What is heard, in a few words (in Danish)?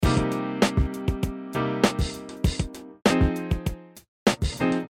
i seng beat piano loop